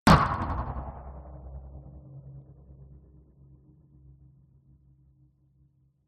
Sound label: sound design impact metallic sharp hit droning tail; zapsplat